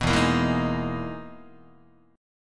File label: E-Major Sharp 11th E, Guitar; EM#11 strummed; JGuitar